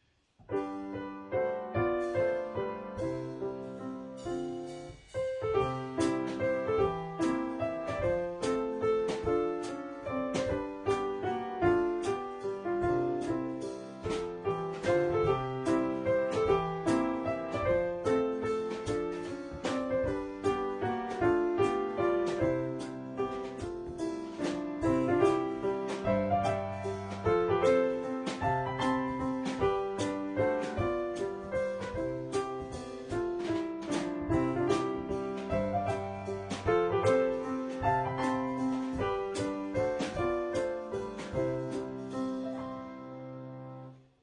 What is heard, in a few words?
piano drums